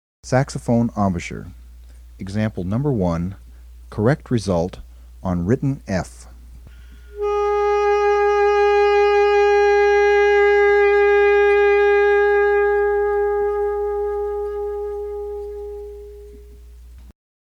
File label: Emb SAX; saxophone